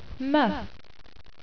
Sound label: mhh